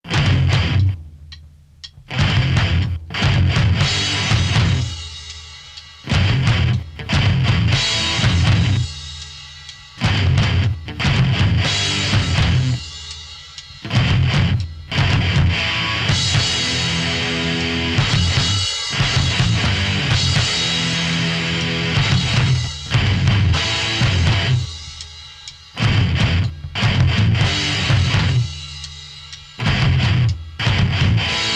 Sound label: Riff Tapes